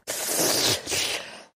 slurp

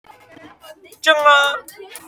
cheering liar

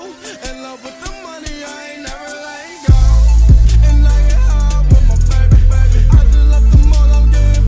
bass boosted

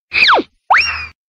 banana peel slip

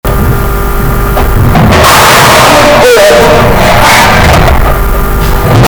clang big extend

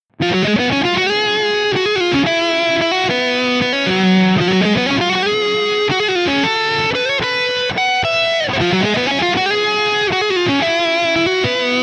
tapedeck

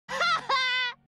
laughing; Mabel Pines

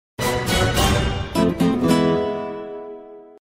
spanish guitar strum followed by orchestra hits

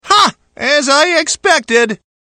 hah

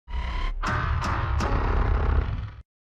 mechagodzilla laugh sound effect

